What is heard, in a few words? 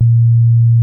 SINE WOOF B2, ANALOG BASS; Music Store - Claudius Diemeter Schwerlos